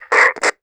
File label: Walkie Talkie Static; Communications